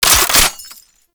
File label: Belt Fed Rifle Reload; Warframe; Tenno; Frumentarius